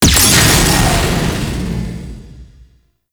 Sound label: Sentient Vessel Weak Point Hit; Warframe; Tau; Hit Notifications